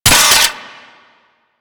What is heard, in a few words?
Hand Rocket Launcher Mech B; Crp Hand Rocket Launcher